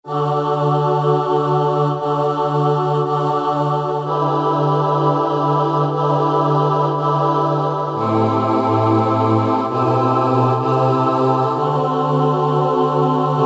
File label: Choir; Voices